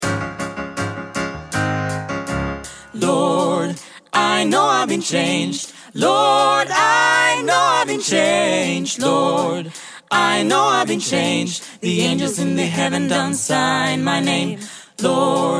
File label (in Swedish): Sopr ten; trad